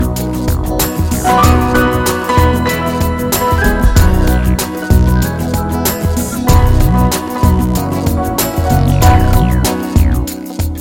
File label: Pretty and Smooth Groove